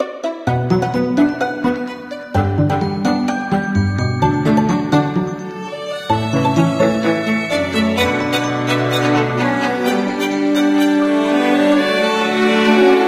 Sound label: Go Beyond Strings, ringtones; Honor; MagicOS 7.1